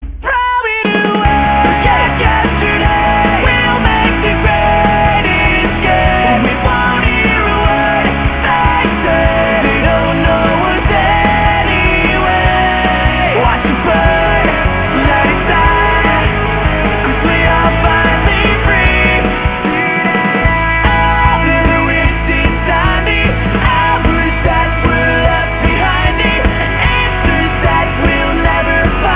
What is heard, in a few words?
disco